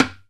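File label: hi timbale closed; E-MU Producer Series Vol. 2 – More Studio Essentials; Composer; Timbales Xswitch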